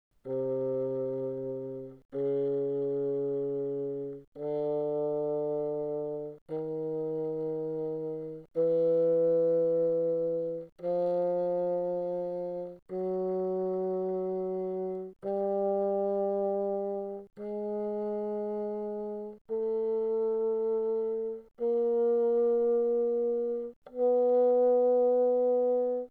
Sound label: Bassoon pp C3 B3